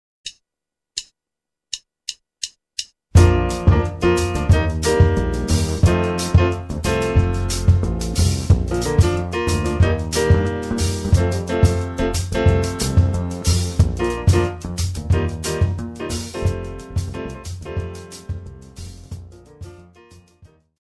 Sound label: jazz basic